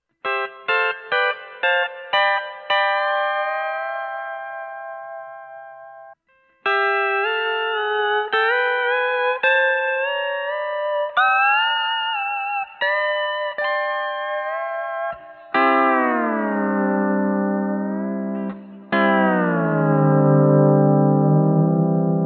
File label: 57 Off Axis